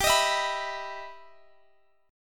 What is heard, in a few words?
E Minor Major 7th G